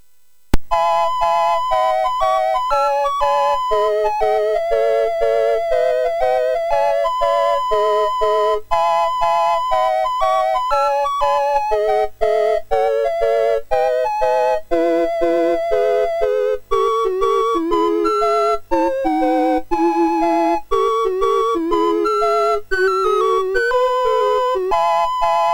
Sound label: sid, MOS6581R3; Utter Ego Demo